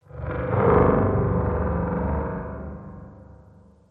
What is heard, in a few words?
amb gen low metallic, ambient